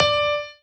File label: piano melo